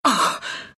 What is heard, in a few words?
pain, ru; Dota 2